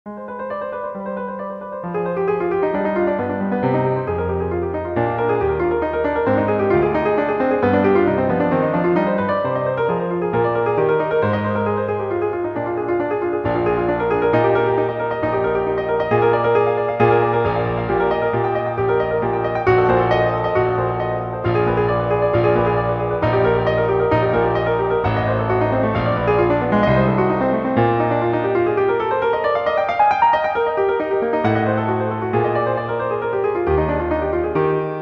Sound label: Classic Intro Piano solo Barock